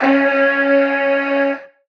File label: vocal wonder, C#; SD AURORA Sample Pack; Slate